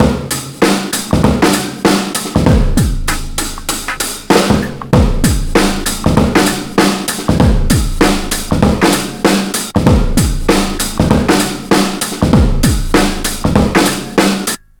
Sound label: 97.2 bpm, Breaks; BONITA; POTBreaks